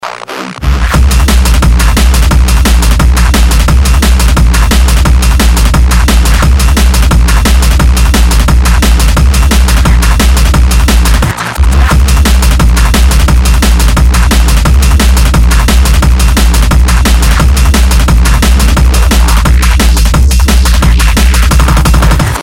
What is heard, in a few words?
Drum'n'bass